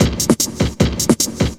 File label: Drum Loops, 150; Skooler; M8; Electronisounds